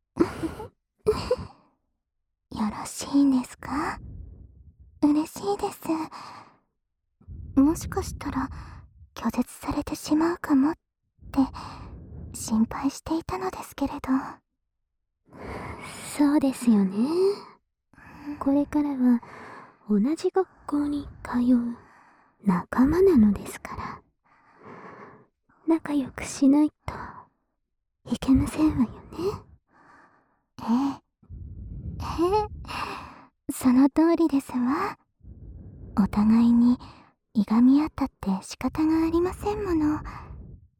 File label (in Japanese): 両耳に耳ふ～と耳キス; 親愛の印の両耳に耳ふ～と耳キス